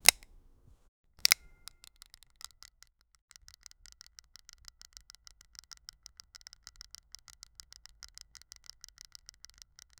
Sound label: flicking open a small han; device